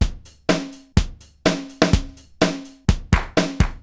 Akai XE8 (125BPM), beats

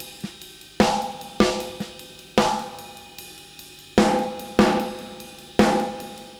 Beat Loops; Zero-G; Monster Beats

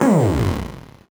VocoBit Noise, 8-bit; musicradar; 8-bit bonanza

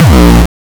909 Dist Reso Crushed - A#1, Kicks; Drums; Mbase 11 Kicks

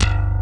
SLAP BASS; Pro-Rec - Synthsonic; BASSES

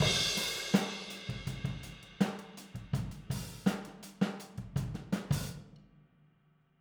Boogaloo Outro Overhead, 82 BPM, drums

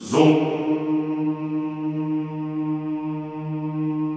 CHO ES2#; Sound & Vision; Gigapack I CD 2; CHO Soumm+Houuu

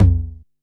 Tom Analog, Yamaha RM1X; AnlgKit01

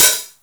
HH OPEN; Big Beat Samples & More; AKAI; HHOPEN1 KIT

